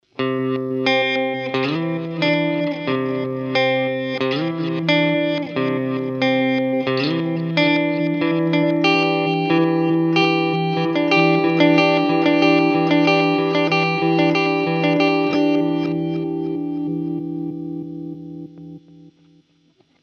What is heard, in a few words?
Reverse